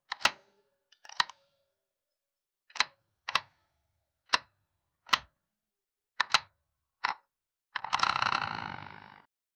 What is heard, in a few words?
slick ui touch sound, mobile game